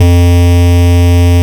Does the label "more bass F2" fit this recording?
Yes